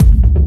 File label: reverbed dark rolling techno kick; kick drum samples